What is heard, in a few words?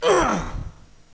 pain; alienarena; femforcer